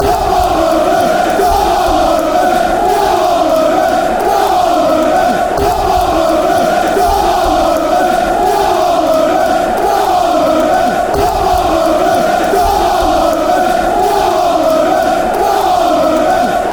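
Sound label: come rovers, football chants; brfc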